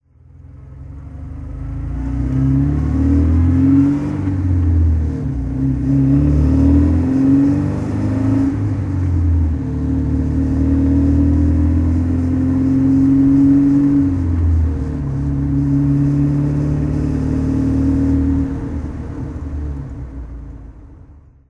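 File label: austin healey driving, engine sound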